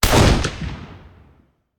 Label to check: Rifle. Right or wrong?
Right